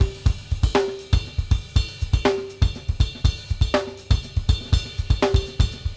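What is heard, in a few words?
groove, 6-8, drums; Sampleheads; New York City Drumworks VOL-1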